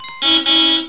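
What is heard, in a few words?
pick up; Alien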